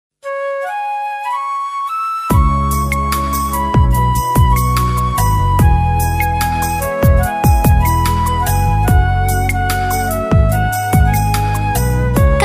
beat

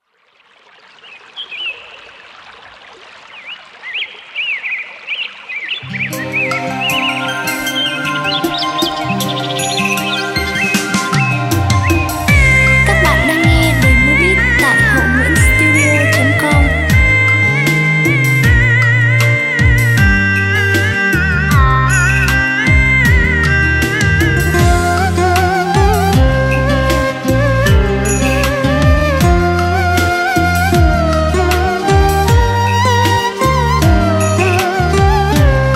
beat

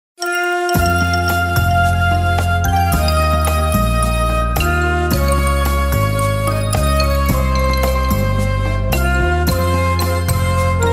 beat

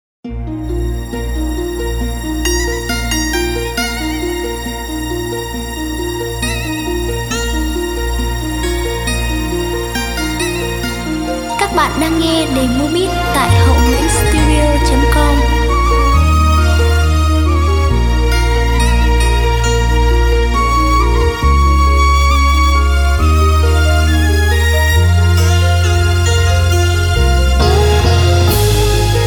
beat

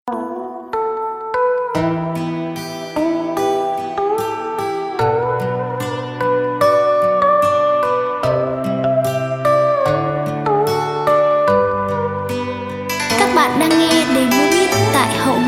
beat

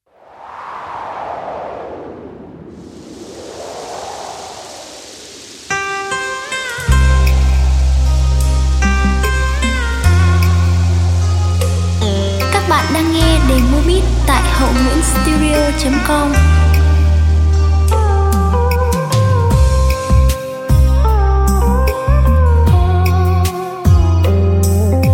beat

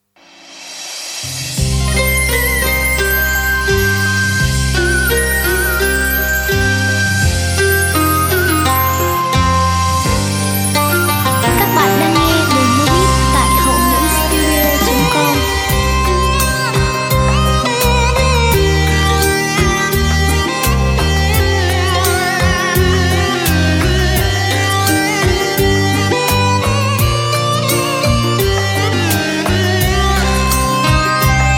beat

